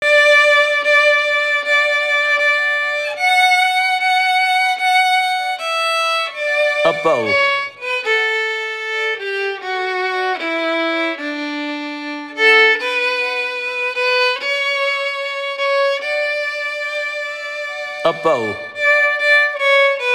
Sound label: Joy to the world Violin